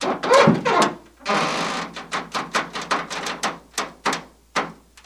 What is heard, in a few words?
Metal Creak Lng; hits & rattles